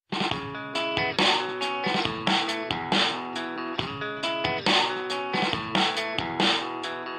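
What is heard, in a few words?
Complication Notification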